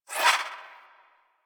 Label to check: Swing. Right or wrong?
Right